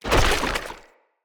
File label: Corrupted Slug Hit React; Creatures; Movement